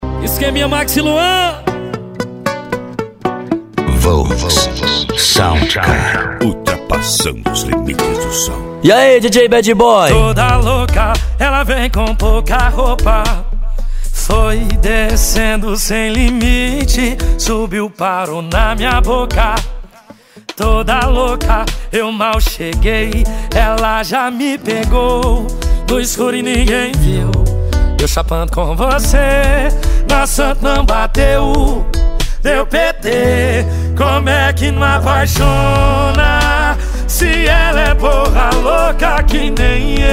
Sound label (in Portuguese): sertanejo e pisadinha; cd volks sound car